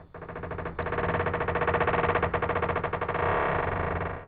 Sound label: Stress; In the Line of Duty Firefighter